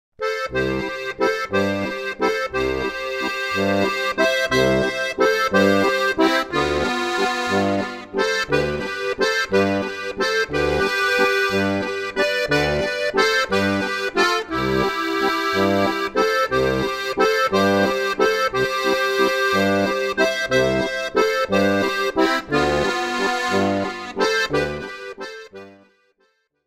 walzer

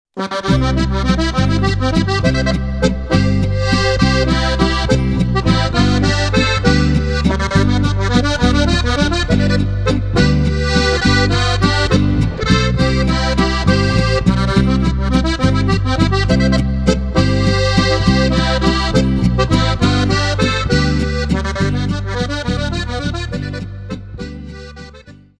landler

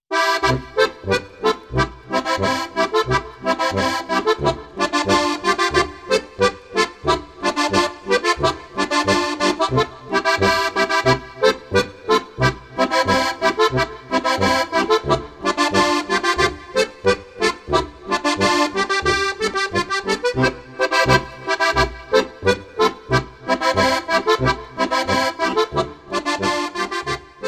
polka, Harmonika; Leicht und Zünftig - Folge 4

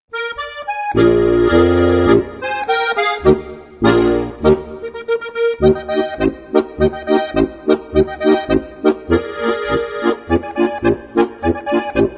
trompeten echo

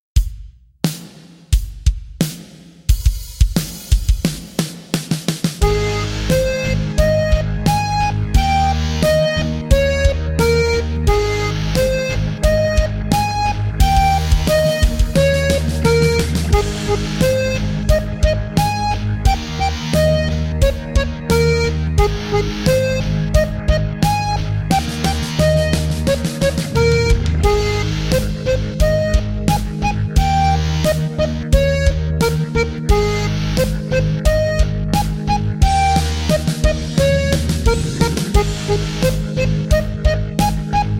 3er reihen rock; Knöpferl Geschichten 1

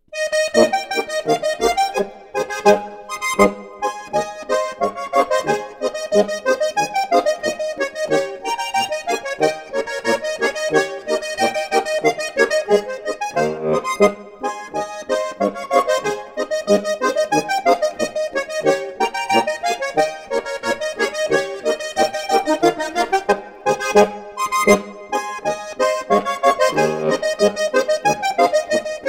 Zillertaler Poltra Boarischer

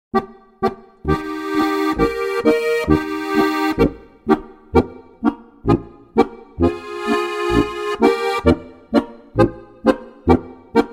polka

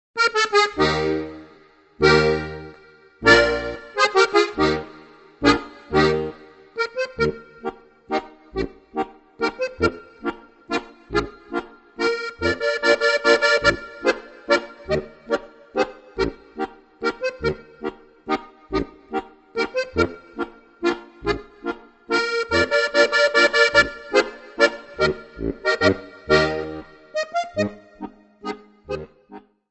plattler

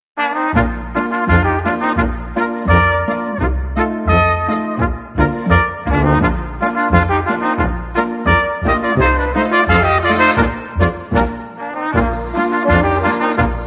boarischer

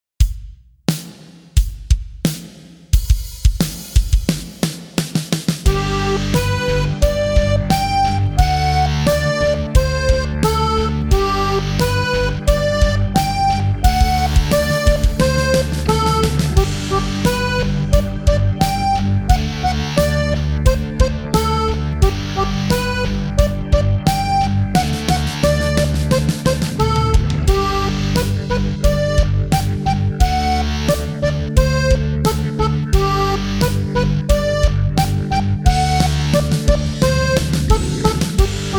3er reihen rock; Knöpferl Geschichten 1

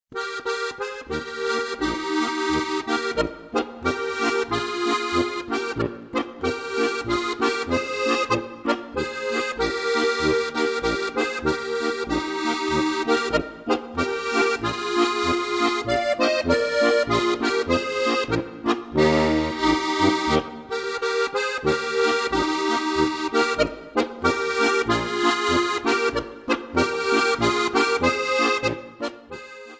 Puchberg Polka; Spielstücke für Steirische Harmonika, Band 1

